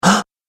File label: Gasp Male Short; voice; gasping; Gasping Sound Effects